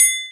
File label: triang b3